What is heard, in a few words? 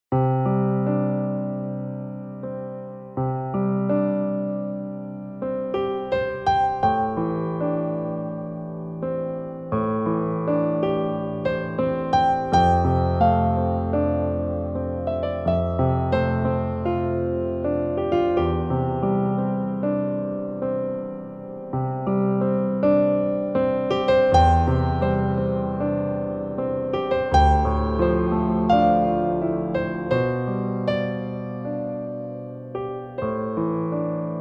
Piano